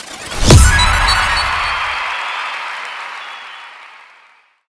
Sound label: perfect; fridaynightfunkin